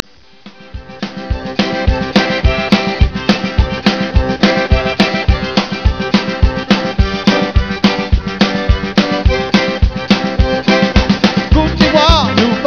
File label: cajun